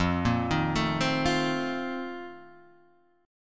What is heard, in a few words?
A sharp Major 7th Suspended 2nd Suspended 4th